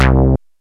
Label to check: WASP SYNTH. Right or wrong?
Right